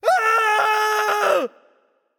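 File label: nonverbal fear; voicepack; smite; merlin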